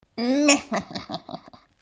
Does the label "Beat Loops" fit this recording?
No